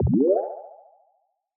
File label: Battery; バッテリーチャージ音